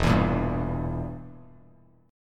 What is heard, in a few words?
E Diminished down Bass Standard, chord; Edim strummed; JGuitar